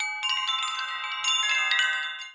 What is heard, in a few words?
light particle